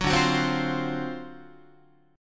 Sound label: D 7th Sharp 9th, Guitar, chord; D7#9 strummed; JGuitar